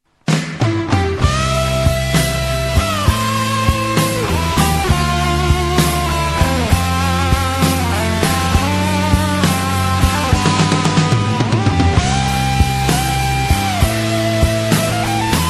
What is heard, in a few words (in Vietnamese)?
nhac; khtn 6 canh dieu